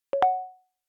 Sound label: deal closed